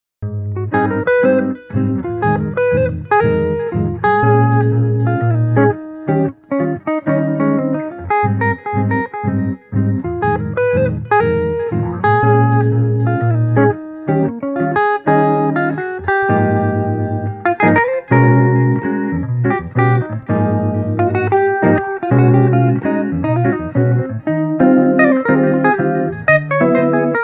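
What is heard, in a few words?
Street Guitars Cheerful Melody, 120 bpm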